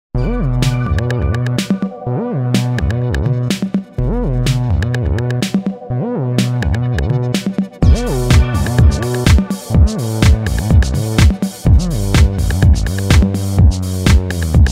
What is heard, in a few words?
Club X; Soundzabound